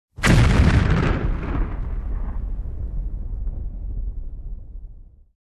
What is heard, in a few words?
Large explosion or cannon in a close perspective